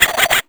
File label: Percussion; Vengeance Samples